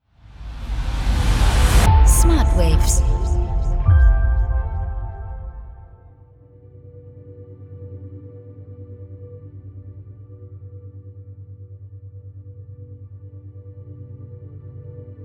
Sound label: Tibetean bowls